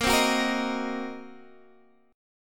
C Minor 7th Flat 5th Bb, chord

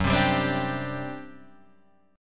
G Major 7th Suspended 4th, Gb

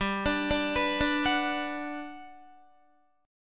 G Major 7th, G, down, Guitar, GM7 chord; arpeggiated; JGuitar

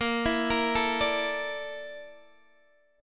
A sharp Major 7th Flat 5th A sharp Guitar DADGAD

